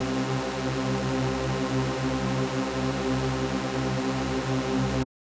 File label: SuperSaw C4; SuperSaw1; KG MegaSaur; M8